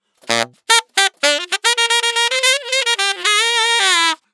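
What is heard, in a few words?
110 NuJazz Sax G major; Digidesign; Pro Tools; Pro Tools Essential Loops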